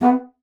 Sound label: bassoon B3; Xsample VOL-6; C BASSOON